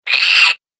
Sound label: Nasty Little Monster; horror; Monster Sound Effects